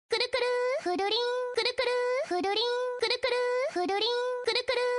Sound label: notification